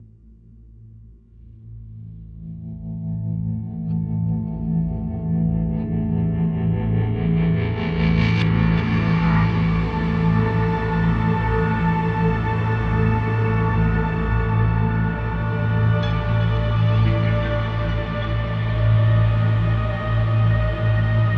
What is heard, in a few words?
Mood Suspense; The Long Dark